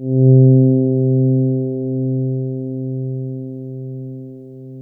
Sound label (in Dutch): Ochtend Pad, FM; Polyend